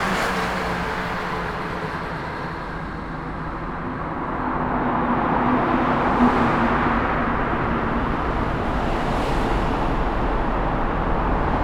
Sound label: CAR AB